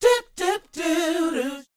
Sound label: SHOO BE, Vocal R&B Dance